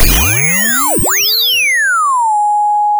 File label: pop, sound of a bike bell